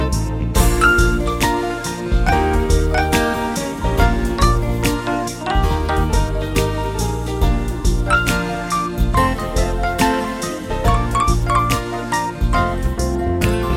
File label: Easy Groove